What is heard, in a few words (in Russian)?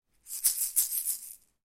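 звука шейкера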